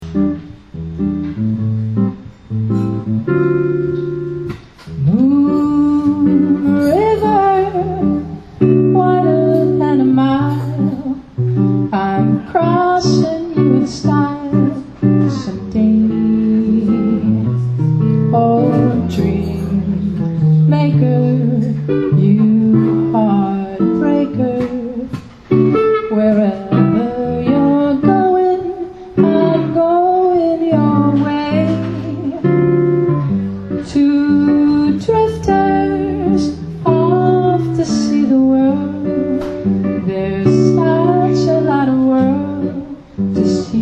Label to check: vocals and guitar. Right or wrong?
Right